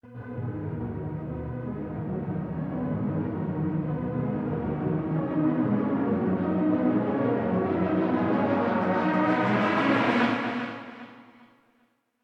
Mainlead reverb

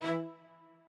strings

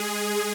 lead

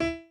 piano chord

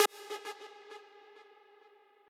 lead

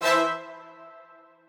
strings

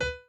piano chord

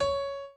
piano chord

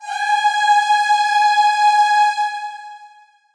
choir

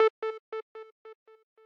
arp

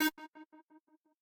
synth

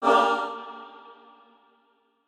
chor

